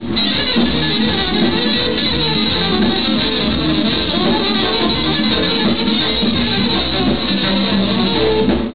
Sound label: jazz